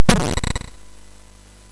SFX, MOS6581; sid; Narco Police

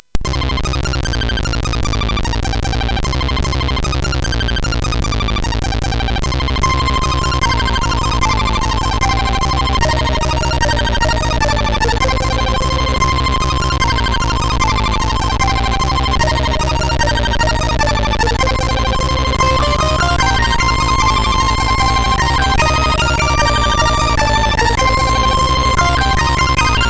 Multivoice 2, CSG8580R5; hvsc

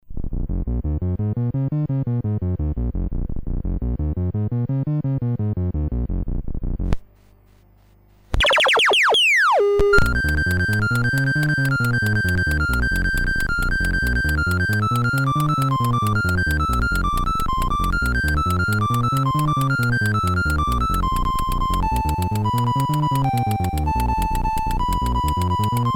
MOS6581R4, GAMES; Tron BASIC; HVSC

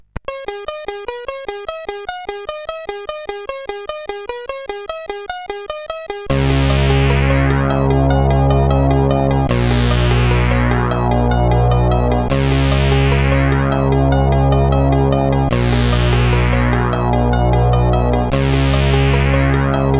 revisited, CSG8580R5; hvsc

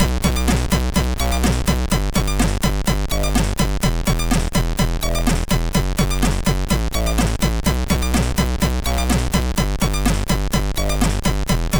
Left Arrow, MOS6581R3

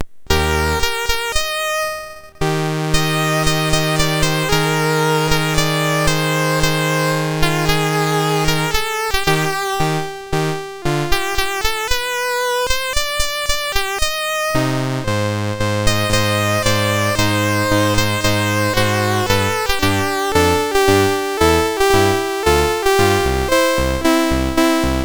Recitative, MOS6581R2; Pirates of Penzance

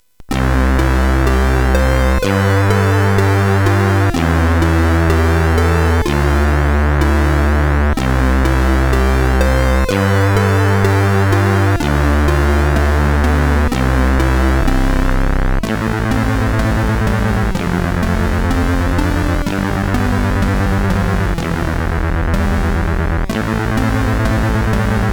X-Mas, CSG8580R5; hvsc

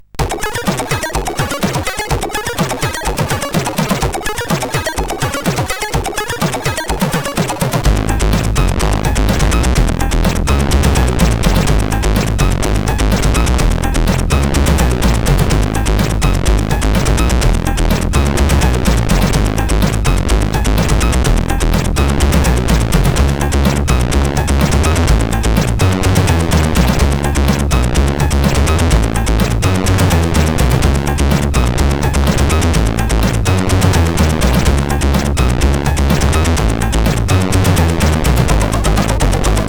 tune, sid 8580R5

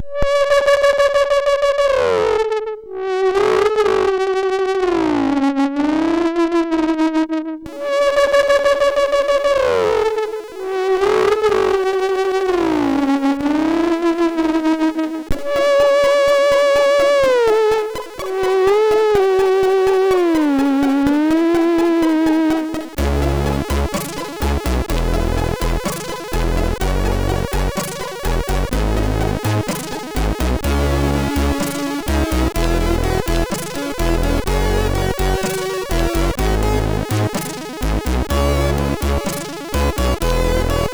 MOS6581R3, sid

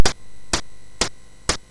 Fast Sfx, MOS6581R3; hvsc

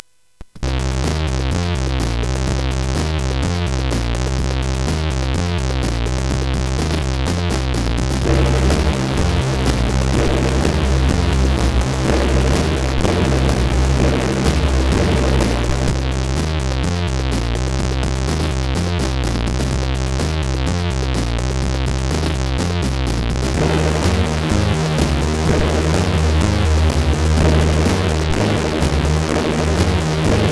Just Barrel, CSG8580R5; hvsc

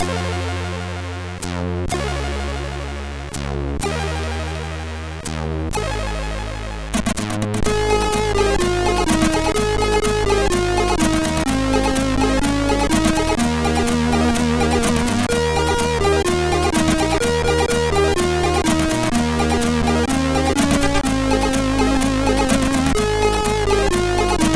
Tango, MOS6581R3

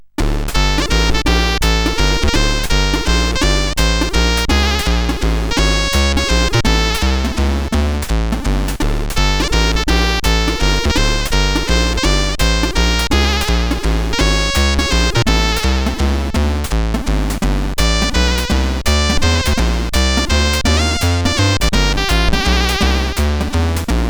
Walking Waltz, sid CSG8580R5

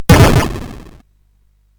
SFX; Narco Police

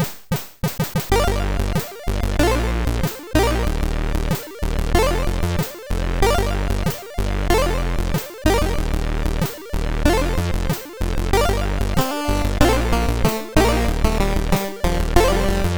Relaxation, MOS6581R3 sid

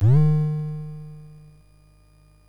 Project Firestart, MOS6581R4

